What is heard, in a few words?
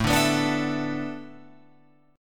A Suspended 2nd Suspended 4th, Guitar, Open F; Asus2sus4; strummed; 9,9,11,9,12,11